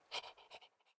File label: dog breathe; smite; god daji skin15